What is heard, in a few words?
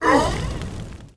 zombie grandma damage, monster